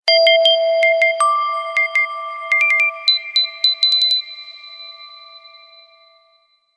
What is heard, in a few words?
Rythm; LG KF600